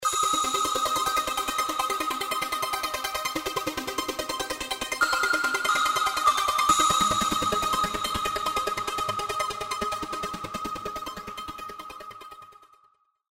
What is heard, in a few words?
rotation theme ring